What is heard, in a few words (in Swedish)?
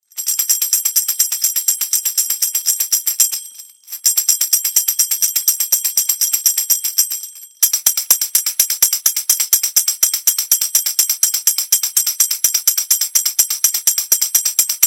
babytamburin ljud